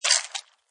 Flesh Squish, Animal or human flesh being torn, squashed or ripped.; Injuries; Combat